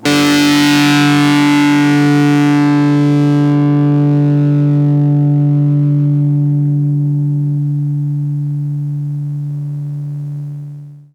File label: Blues Open D4, Blues Guitar